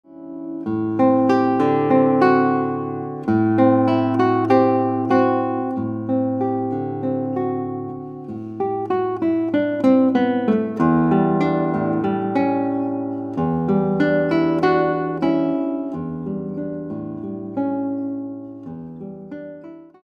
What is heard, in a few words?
Guitar